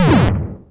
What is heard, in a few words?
Bullet Bill Cannon